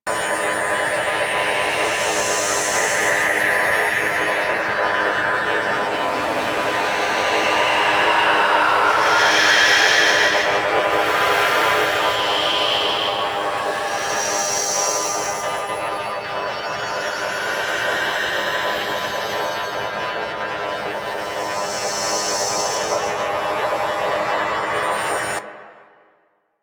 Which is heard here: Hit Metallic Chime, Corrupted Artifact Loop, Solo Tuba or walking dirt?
Corrupted Artifact Loop